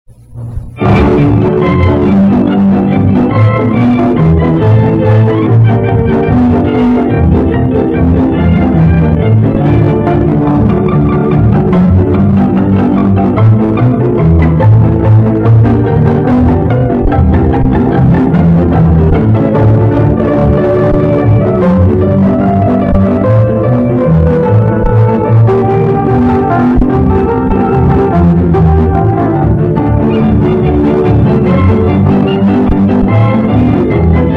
Tuberosa csardas slow

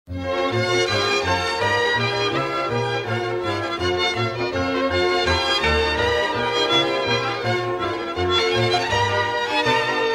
Helenka Czech

